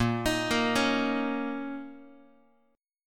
A sharp Minor Double Flat 5th, Guitar; arpeggiated; 4A,4E,5A,5D,5G#,6C#; x,6,6,8,5,x; JGuitar

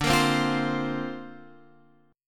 D-Minor 7th Sharp 5th D, chord; Dm7#5 strummed; x,5,8,5,6,8

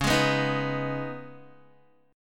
Db 7th Suspended 2nd, Guitar; 8,11,11,8,11,x; Guitar-4F,4A,5C,5G,5C,6E; Db7sus2 strummed

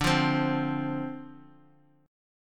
D-Minor 7th D, chord; Dm7; strummed

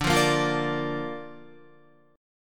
D Minor Sharp 5th D Guitar; Dm#5 strummed; 9,8,10,10,10,10; JGuitar

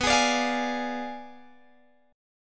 B Diminished 7th B down Guitar Open G

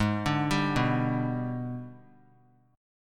G sharp Minor Major 7th Flat 5th; arpeggiated; JGuitar

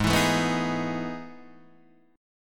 E Major 11th chord

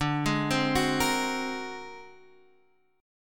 Ab 9th Flat 5th D

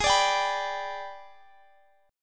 Gsharp Minor Major 7th Flat 5th; strummed; x,x,18,19,20,19; G#mM7b5

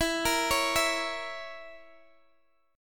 A sharp Diminished, chord; A#dim/E arpeggiated

